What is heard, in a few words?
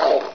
con idle; controller; Source Engine; bshift